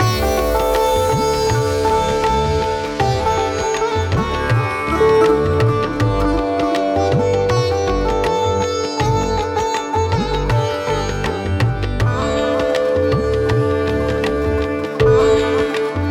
indian atmosphere sitar

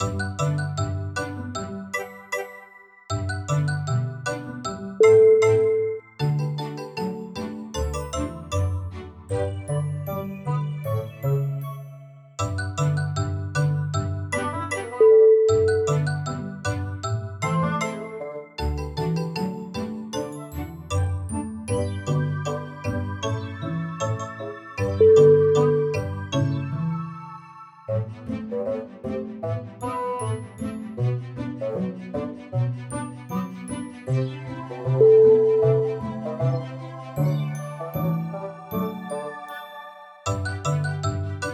playful upbeat

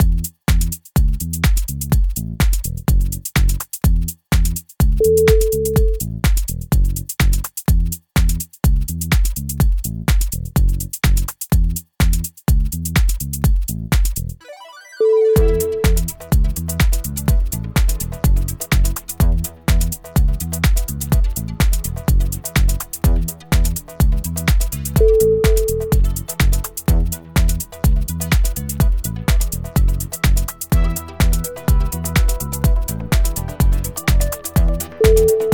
tech groove

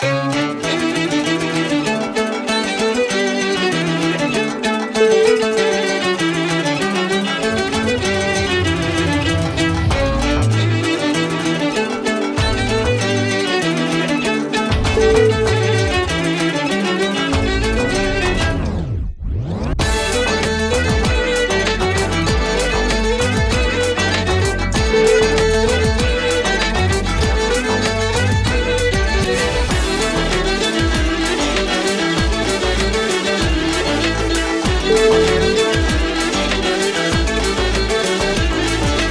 modern middle east

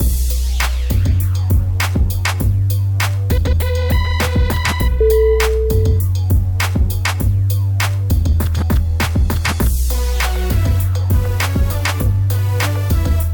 hiphop style

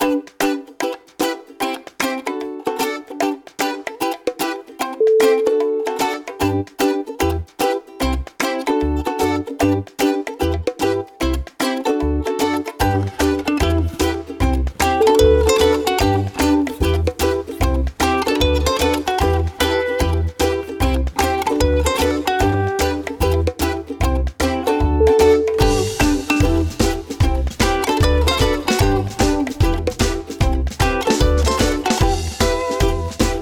ukulele in my heart

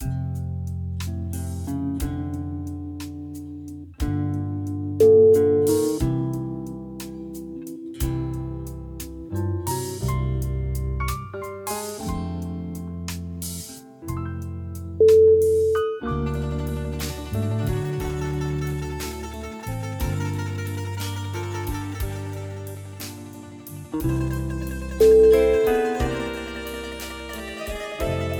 sultry french evening

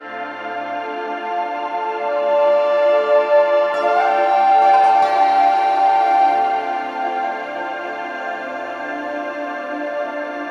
peace with nature; melodyloops

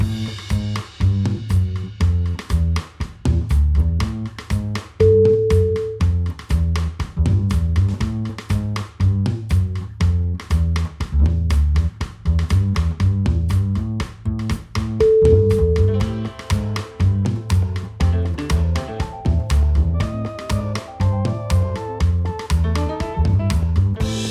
spy jazzy

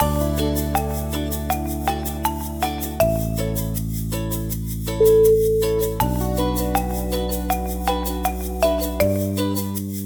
ukulele children

